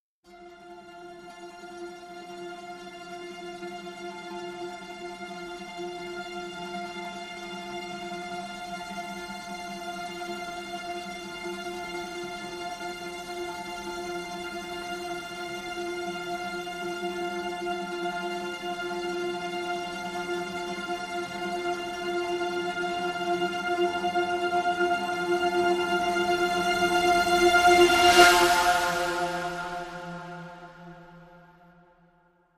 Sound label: Suspended Trems (F)